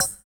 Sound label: DRM OLD, dance synth; Sound & Vision - Gigapack I CD 2